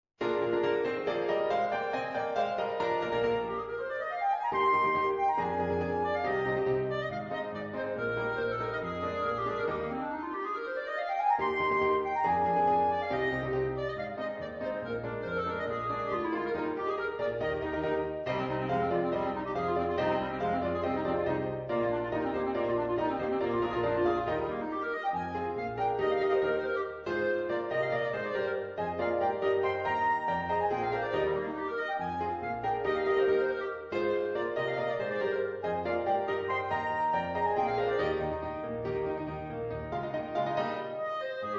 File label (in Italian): Scossa elettrica x cl pf